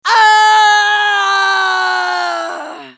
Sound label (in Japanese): ファイター男 死亡